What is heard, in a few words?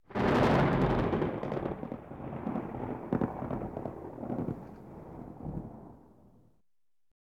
Thunder Crack; Nature Sounds